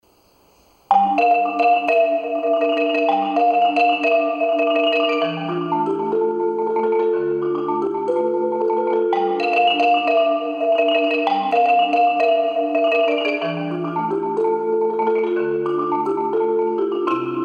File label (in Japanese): マリンバ; 大阪府立港高等学校; 管弦打楽器ソロコンテスト 金賞代表